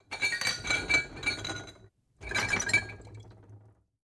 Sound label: Glass Clinks Glass Bottles